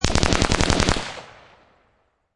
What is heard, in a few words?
Machine Gun Colt Ar 15 Long Burst Close Range; Machine Guns; Lynne Music